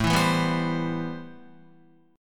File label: A-Minor 9th A Guitar; Am9 strummed; JGuitar